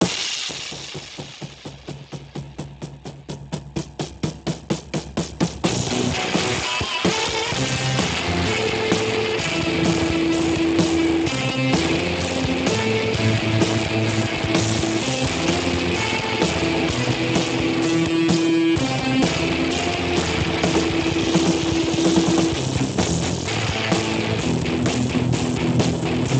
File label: generic rock; speex